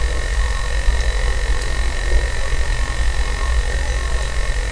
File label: pipeline electric hum loop